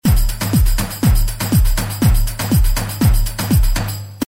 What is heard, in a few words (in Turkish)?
kesanli ritim denemeleri, gw8 ritim